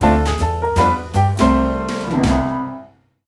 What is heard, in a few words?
Win; Granny Smith